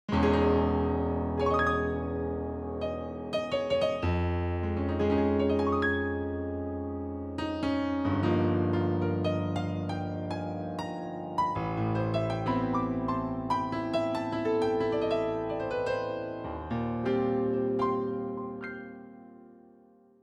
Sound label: grand; plg150-pf